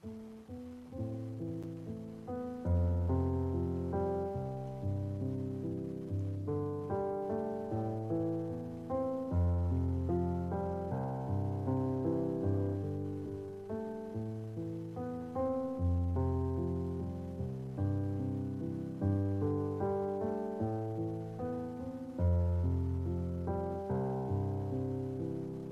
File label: piano, Sad